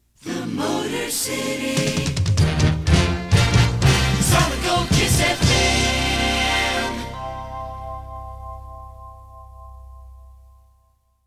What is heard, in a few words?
JINGLE; WKSG-FM; The Motor City Kiss FM